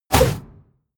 push

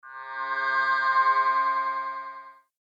swell vowel

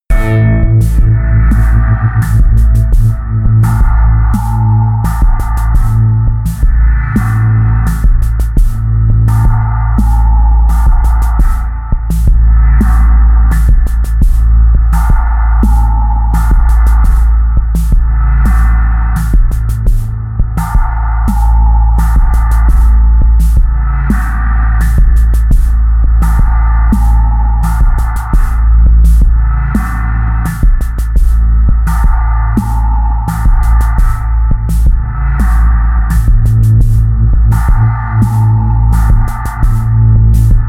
sequence dark beat

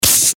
drinks beer bottle opening zzhhh

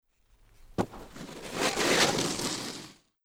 snowboard jump and skids

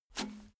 bmx bike release bar soft

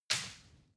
wood branch break bright

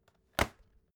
folder close

